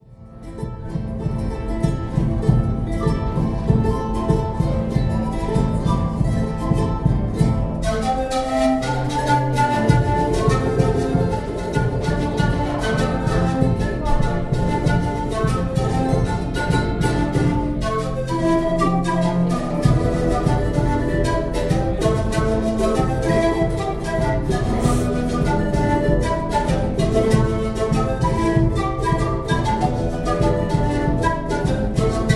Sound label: traditional andean panflute band in cusco peru